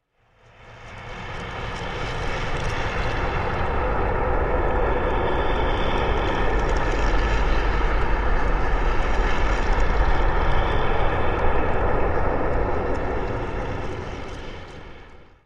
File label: NOISE; Caja de papel